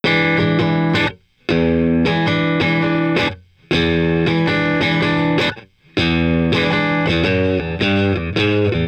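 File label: Blues Guitar Intro; Digidesign; Pro Tools Essential Loops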